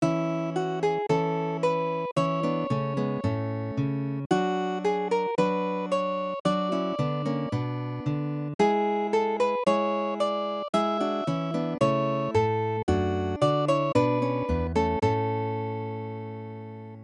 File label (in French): quatuor en la majeur